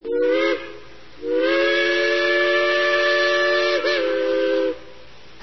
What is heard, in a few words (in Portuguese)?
Apito Shay, locos